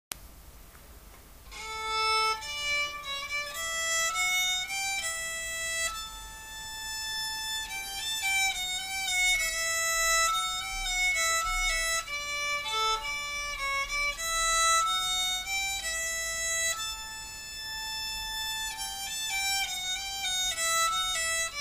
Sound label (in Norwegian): Gauken langsom